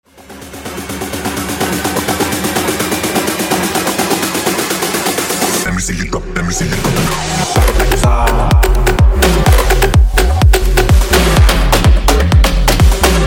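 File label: Transition 126 92Bpm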